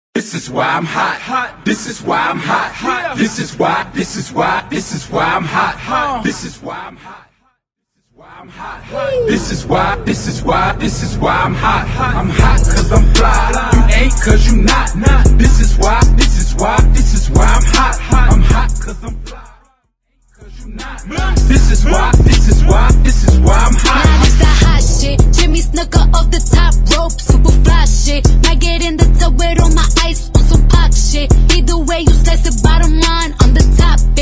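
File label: Acapella In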